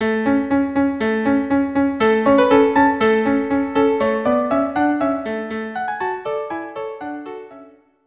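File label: tambourin violin viola; gossec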